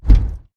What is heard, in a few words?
bt footstep jog parts; Titanfall 2